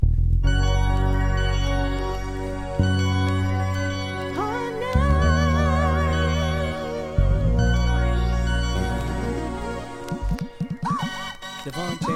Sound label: dancehall riddim